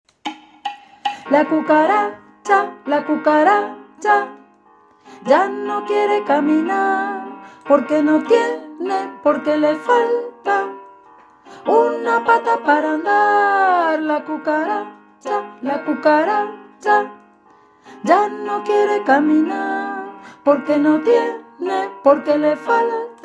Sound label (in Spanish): la cucaracha bajos; Turín